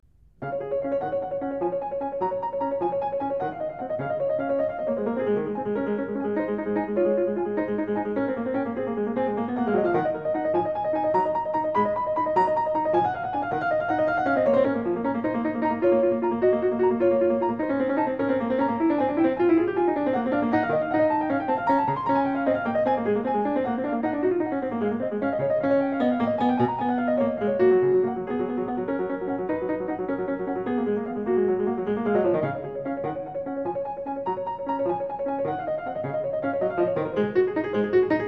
Prelude And Fugue In C-Sharp Major Praeludium; BWV 848

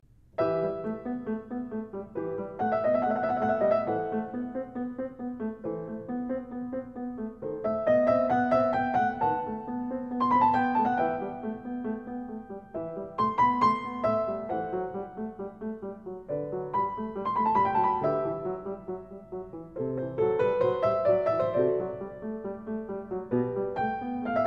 Prelude And Fugue In E Minor Praeludium; BWV 855

